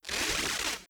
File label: reality breach spark; Stellaris; nemesis